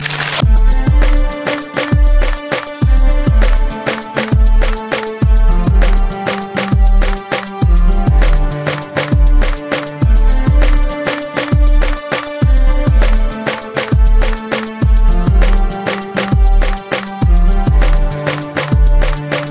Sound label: Hiphop; Hindustan